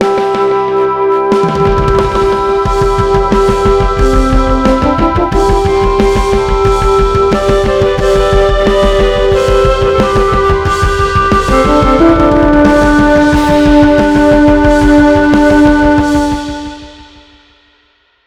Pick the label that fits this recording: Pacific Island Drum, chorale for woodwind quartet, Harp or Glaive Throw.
Harp